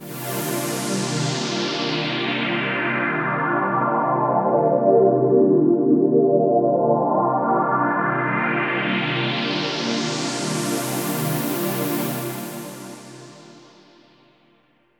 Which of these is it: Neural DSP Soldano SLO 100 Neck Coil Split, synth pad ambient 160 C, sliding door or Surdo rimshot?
synth pad ambient 160 C